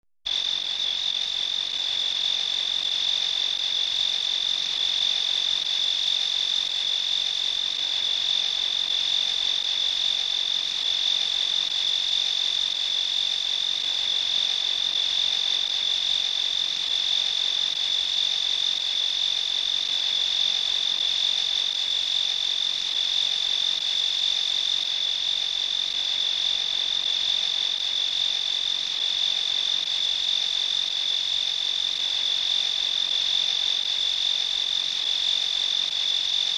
Crickets at Night lofi

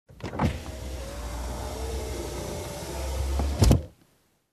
Car window downlift

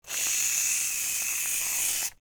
Hair mousse spraying

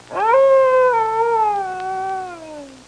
Coyote howling subtle

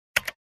Single keyboard key press

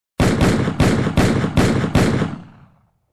Multiple Shotgun Shots

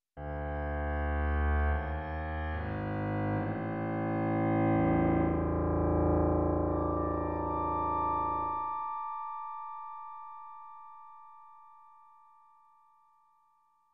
Evil and mysterious piano playing

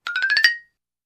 Short cartoon climbing sound effect

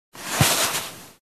Box of clothes being dragged

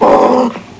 Generic growl sound

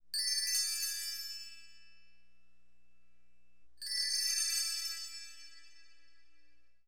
Bell tree twinkle

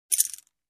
Poker Chips

